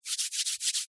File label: human hands rub together dry